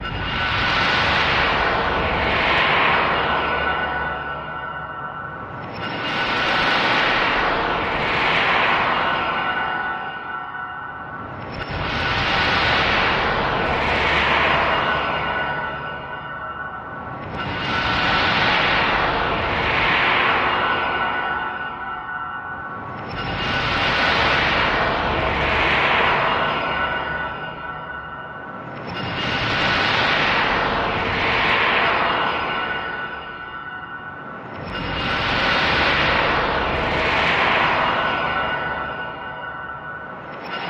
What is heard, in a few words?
Saturns Rings Shimmeri, metallic